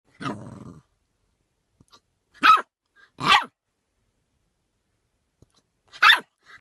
puppy barking; audio meme

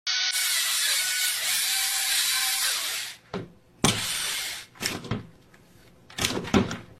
cat with a drill; green screen; audio meme

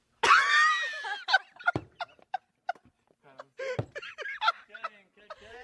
laugh; tobey maguire; green screen; audio meme

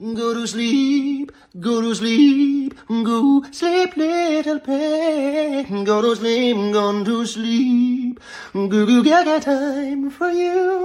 go to sleep song; audio meme

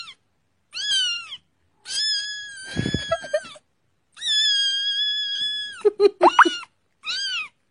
dramatic screaming kitten; audio meme

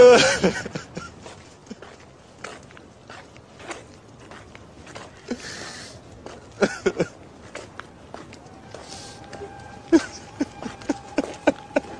pianist walking crying; audio meme

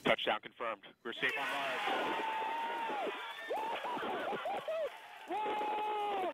landing screaming; nasa curiosity; audio meme

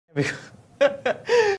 laughing; dynamo; audio meme

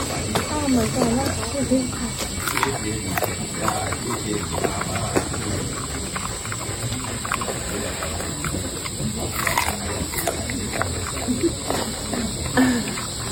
bear eating carrot; green screen; audio meme

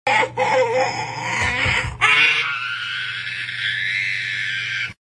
little chef screams; audio meme

